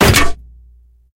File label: bullet hit inside